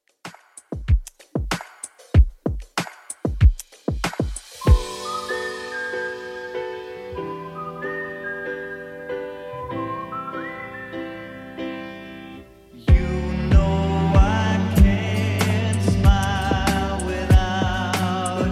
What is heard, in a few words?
70s Redrum 95bpm